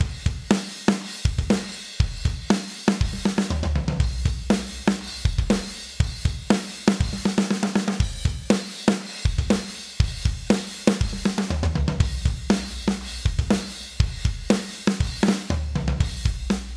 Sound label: after Drums